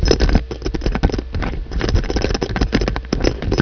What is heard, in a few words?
Metal Armor; Realmz